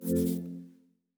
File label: ui hide modal; steam; deck